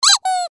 âm thanh thất vọng phim hoạt hình dễ thương

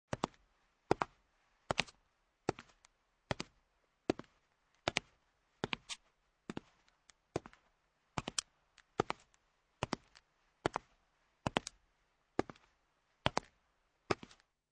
tieng buoc chan di cham

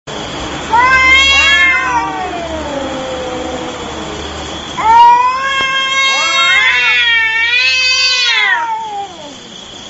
tiếng mèo kêu cắn nhau

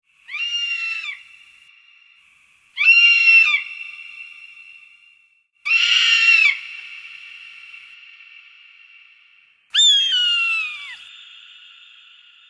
tieng dai bang

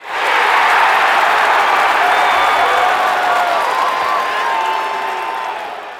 am thanh tieng co vu tran bong da

